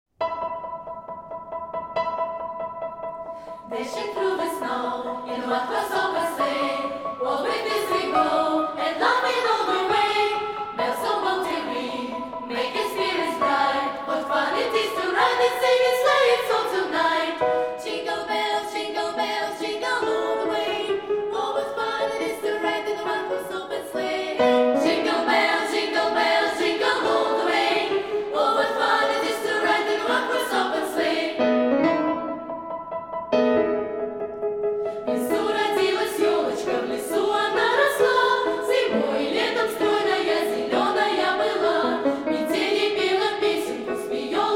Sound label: hor, jingle bells